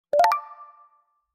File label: notification